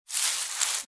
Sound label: hen sit; Cocorico